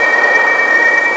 A#4 WIND; Northstar; The Wizard VOL-1; TERROSTROIKA

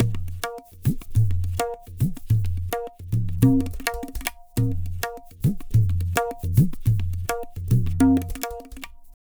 Pure Tabla, 105BPM; Zero-G

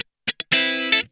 TIGHT, Funk Guitar; Zero G - Funk Guitar

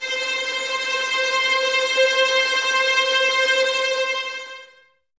VS C 5 Tremolo, 11 Violins; Miroslav Vitous

